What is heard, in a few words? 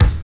KIK CHUD, Dance Kicks; Roland L-CDX-01